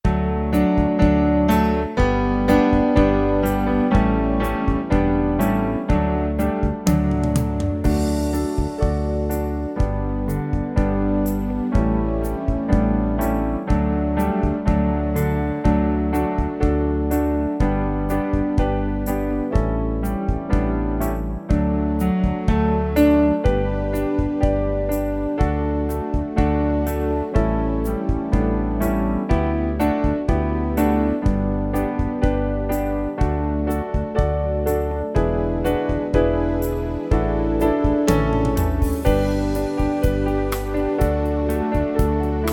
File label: the new testament books song accompaniment only